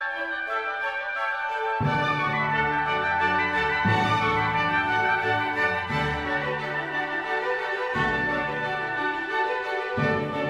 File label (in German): Chor; Magnificat BWV 243